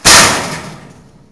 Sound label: scary slam; svencoop; zombie nights